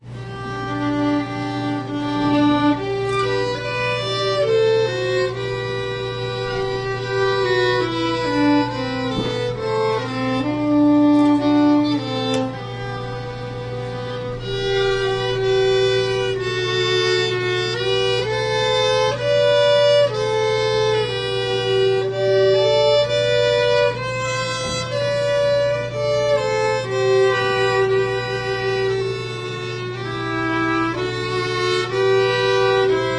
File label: Violin duet